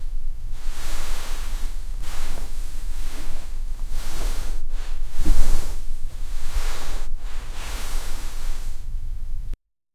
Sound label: blankets and pillows move